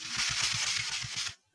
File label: clean